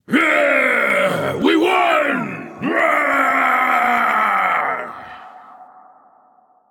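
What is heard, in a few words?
non verbal yay, voice; cernunnos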